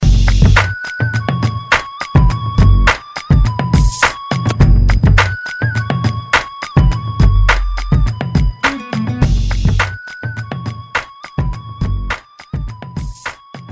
Oriental in Style

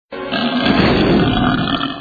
roarrrr sms

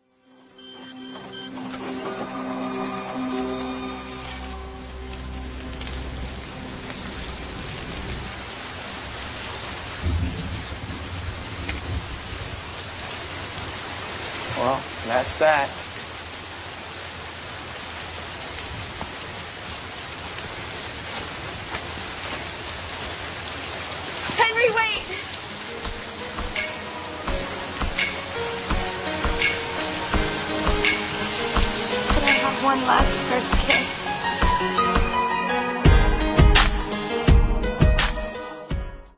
instrumental love beats

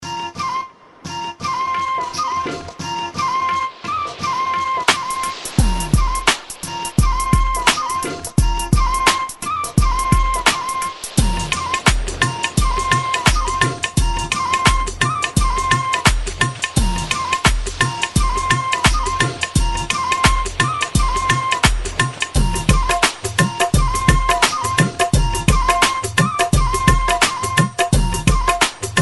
Guitar

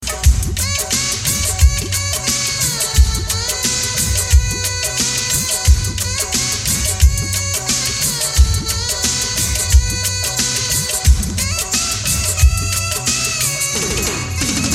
Hindi Violin Melody

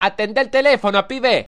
Is Calling Ska, ringtones

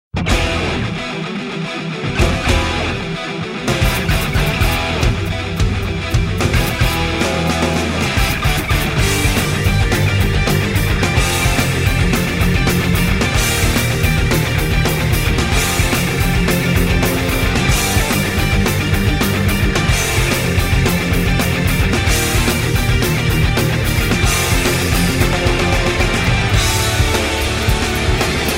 military effect rock

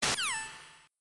Codec Close

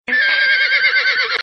horse power; animals

